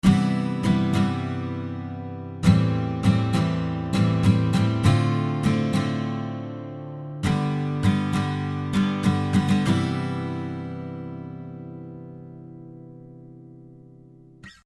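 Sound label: Guitar rhythm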